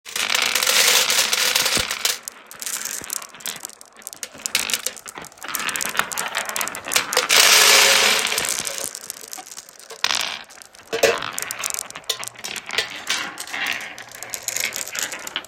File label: Marbles